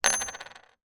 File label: household ring jewelry palladium down on wood surface; zapsplat